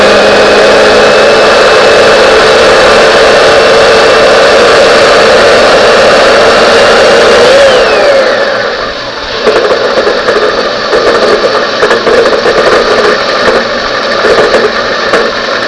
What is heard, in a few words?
knock; 78 Newport; Trans-Plant